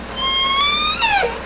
call S1

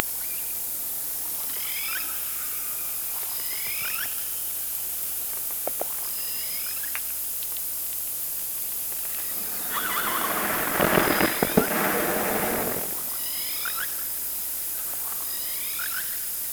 Orca sound Night Passby

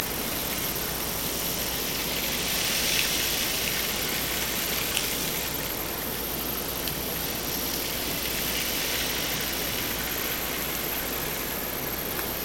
Hydrophones, orcasound

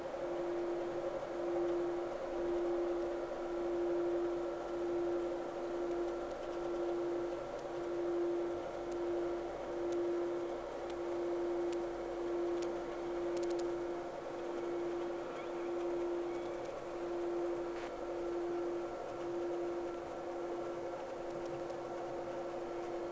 Whales; SeaSnake

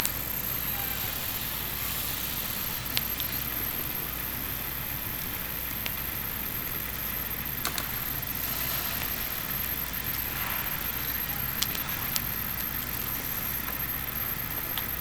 Hydrophones, orcasound

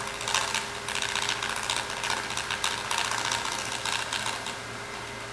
Large Ship, Sea Sounds

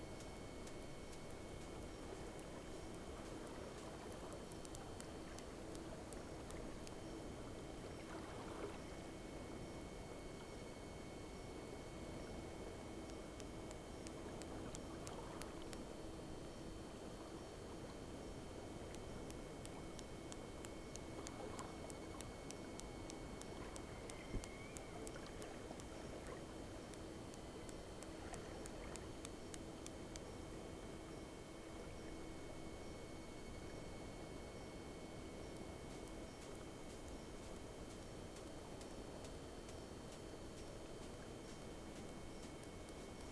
Whales; SeaSnake

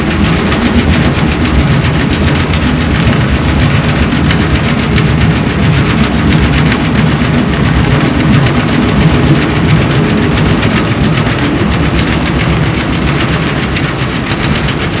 Hydrophones, orcasound

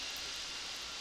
Background Noise

